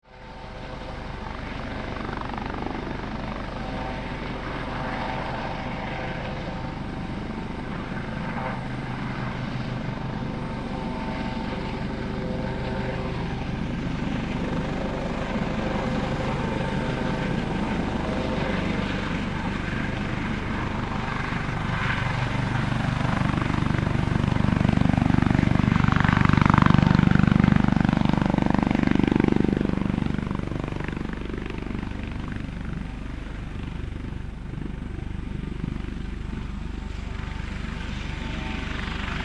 Helicopter Land, Aircraft, Helicopter, Land, Close, Hh-65, Dolphin; Transportation; Helicopter Sound Effects